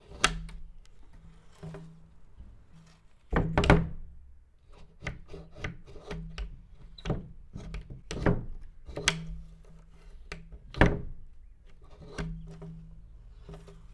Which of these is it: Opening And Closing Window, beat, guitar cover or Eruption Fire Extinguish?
Opening And Closing Window